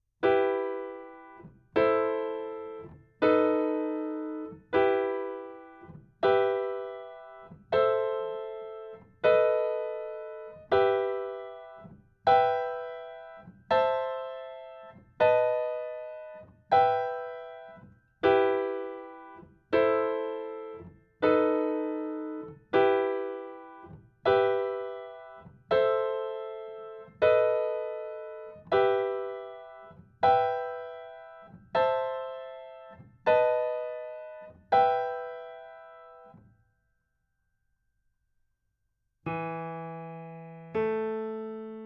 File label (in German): Harmonische Kadenzen in e-Moll Übung